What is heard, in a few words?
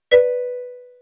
ding; Chatty